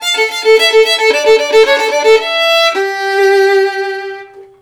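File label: VIOLIN LOOP, Asian Traditions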